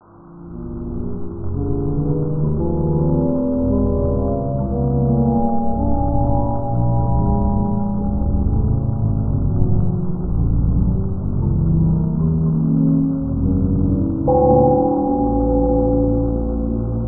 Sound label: wclock bite